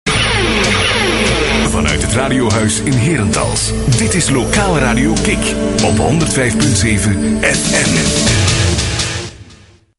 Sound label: Kick jingle